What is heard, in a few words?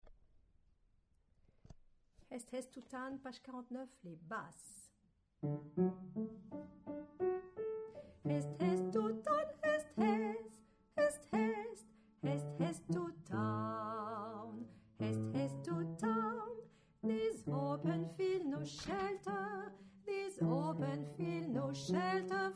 haste haste Basse